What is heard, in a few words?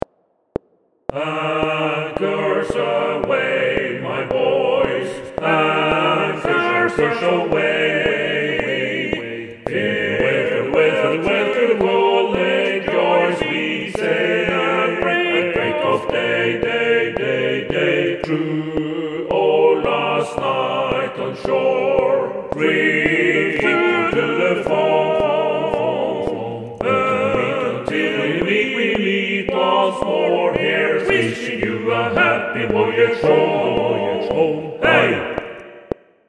TUTTI click; Anchors away